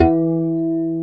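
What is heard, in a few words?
GUI Harmonic Flange E2, acoustic guitar; Best Service Gigapack 2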